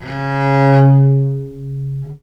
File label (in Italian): C#2, Historical Instruments, VIOLE MUT; USB Soundscan vol.23; AKAI